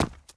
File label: step run rock; FootStep